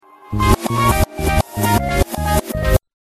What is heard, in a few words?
oh well music